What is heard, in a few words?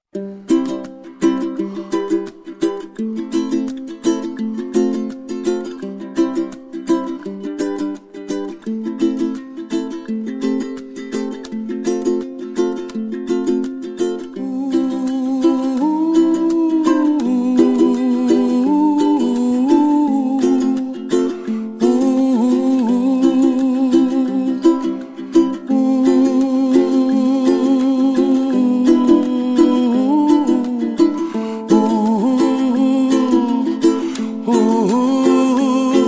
ukulele; Gavayskaya gitara